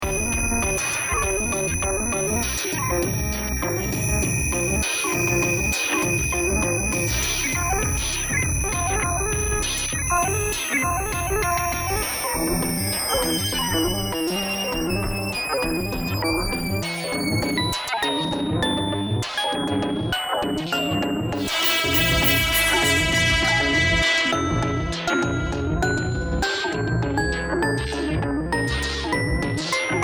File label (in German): bergmelodien electronic